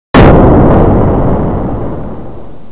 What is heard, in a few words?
banging